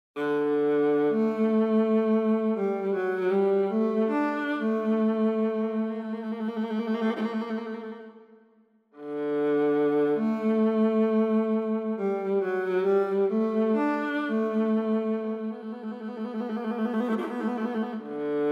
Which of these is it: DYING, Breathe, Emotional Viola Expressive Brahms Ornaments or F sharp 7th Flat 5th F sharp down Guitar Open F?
Emotional Viola Expressive Brahms Ornaments